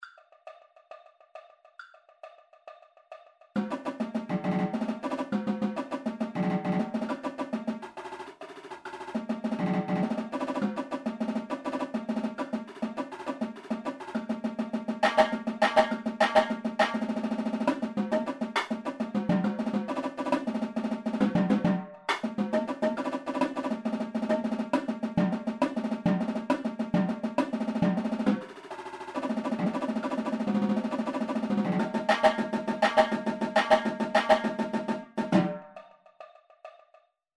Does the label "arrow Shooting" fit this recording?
No